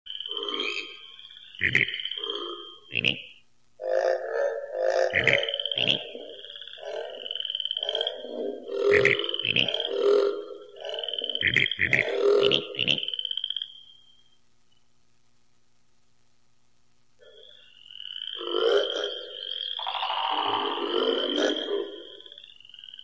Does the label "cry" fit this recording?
No